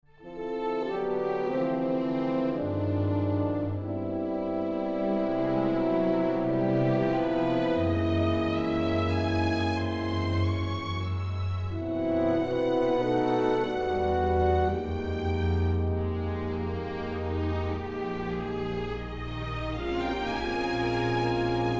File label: Strings F Horns; SM F horns